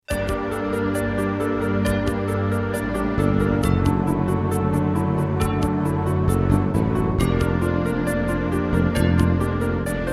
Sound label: Terratec MiniWaveSystem TTWT; Quest for Glory 4; QFG4